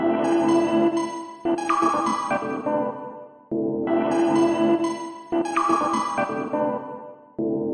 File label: 124 twinky funny, SYNTH AND ELECTRONIC LOOPS